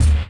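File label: hollow breath; Pro-Rec - Synthsonic; HIP HOP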